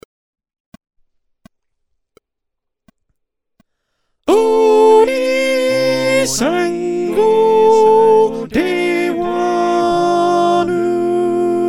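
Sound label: soprano, choir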